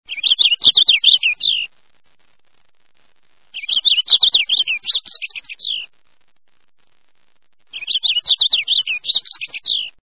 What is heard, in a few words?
Purple Finch